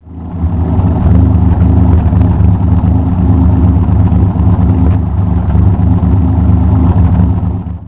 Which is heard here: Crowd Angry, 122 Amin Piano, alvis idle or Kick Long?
alvis idle